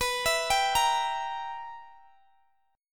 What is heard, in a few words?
B Major 7th Suspended 4th Sharp 5th, Guitar Open D chord